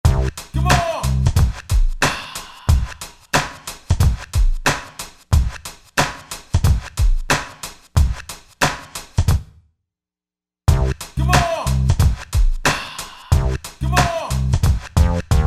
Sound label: Hop Chop